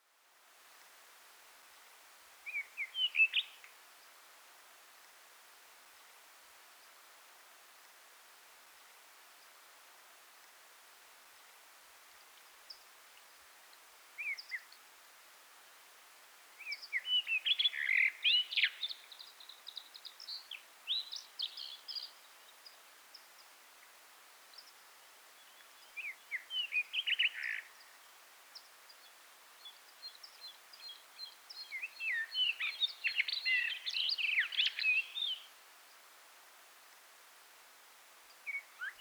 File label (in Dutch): rode rotslijster zang